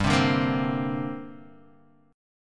C 7th Suspended 2nd, guitar

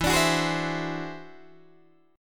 E Augmented 9th E down Guitar Open D

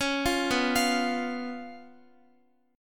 B Suspended 2nd Flat 5th, Guitar; Bsus2b5; arpeggiated

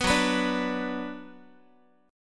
F sharp Suspended 4th Sharp 5th, Guitar; strummed; 14,14,14,12,x,x

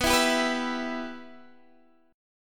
B Suspended 4th Sharp 5th B Guitar Half Step Down

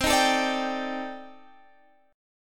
C Minor 6th Add 9th, C, Guitar, Standard; strummed; JGuitar

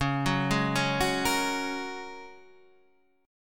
G sharp 7th Suspended 2nd Suspended 4th

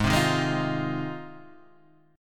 G sharp 6th Guitar, chord; strummed; Standard; JGuitar

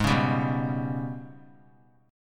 Ab Minor Major 7th Double Flat 5th, down; AbmM7bb5; strummed

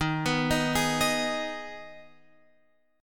Eb Suspended 4th Sharp 5th, Guitar, Open F; arpeggiated; x,10,11,10,11,10

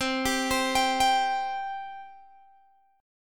C 5th, Guitar, Open G

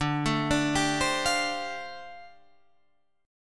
G 7th Suspended 2nd Suspended 4th D

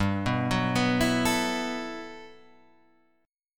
G Major 13th; arpeggiated; JGuitar

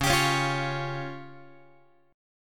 Db Major Flat 5th Db down Guitar; x,9,10,11,9,12; 4A,4E,5A,5D,5G#,6C#; strummed; chord shape

